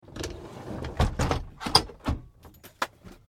Helicopter Door Close, Light metal door in helicopter cockpit; Light metal door in helicopter cockpit being closed.